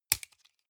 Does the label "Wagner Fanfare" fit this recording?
No